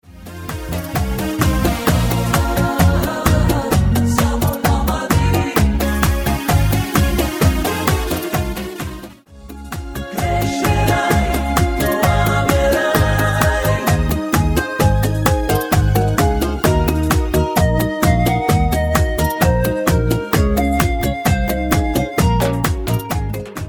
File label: Bachata; Canto